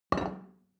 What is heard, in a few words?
plate place table soft short, gastronomie; berufe; soundpool